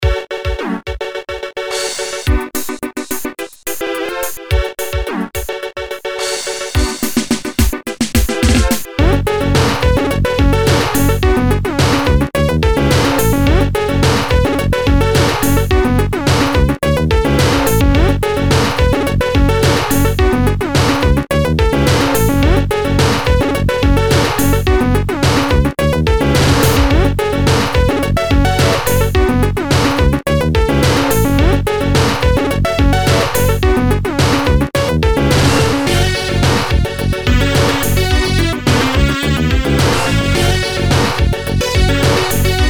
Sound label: Digital Party Tune